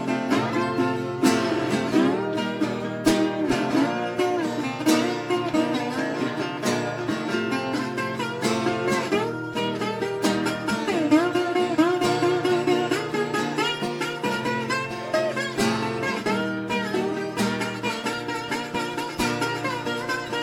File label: Solo